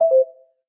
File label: voice heard; Stylo 4 Plus; ui; LG